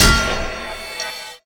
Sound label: SPELLS Shield; Puzzle Chronicles; PS3; PSP; X360